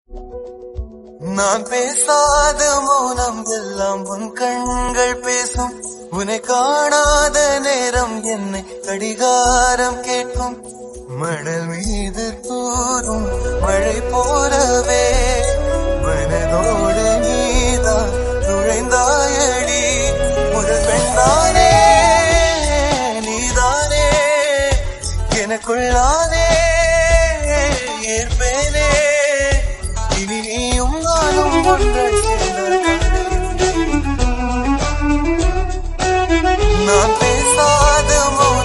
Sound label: romantic ringtone, Tamil